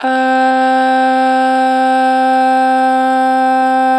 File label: Long Ah, Harmor Vocals; 2 bar flat; Pitch Flat